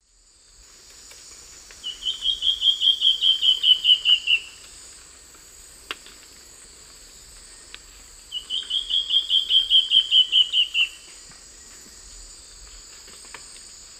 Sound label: Myrmoborus myotherinus, song; S. America; Eastern Lowlands